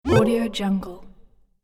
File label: game ghost jump